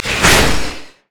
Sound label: blades attack; LoL; Nocturne; umbra blades attack